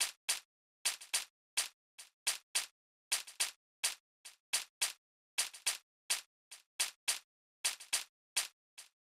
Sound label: Drum Loop - 106 BPM Shaker, Afrobeats; PARADOX II - Drum Loop Collection; Cymatics; Tribute Drum Loop